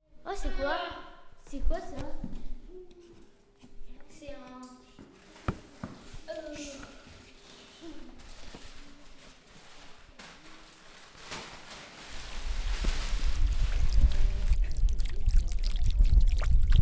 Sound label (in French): Ambiance; la Ducherais